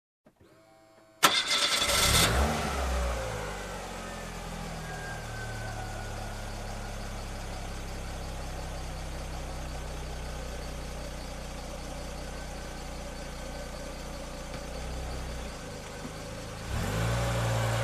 Car engine start drive away

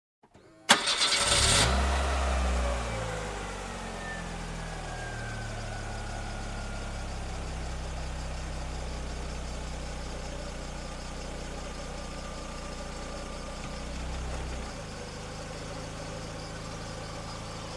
Car engine ignition

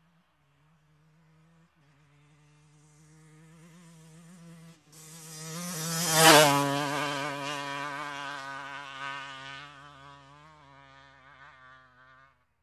Offroad bike passing by

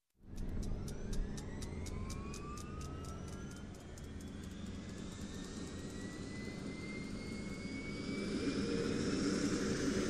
Helicopter engine start and take off

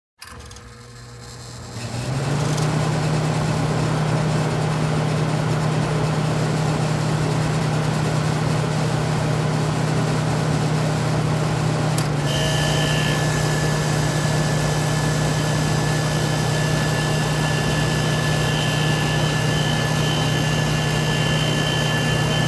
Broken Air Conditioner

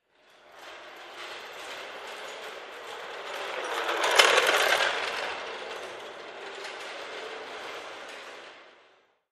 Hand Truck Trolley Push Cart

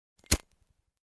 Lighter switched on close

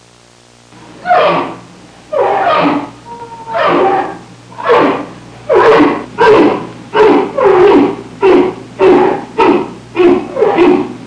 Jungle orangutan sounds

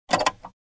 Opening compartment

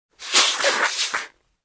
Heavy Nose Blowing

